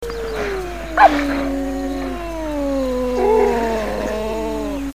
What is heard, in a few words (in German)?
winseln, Geparden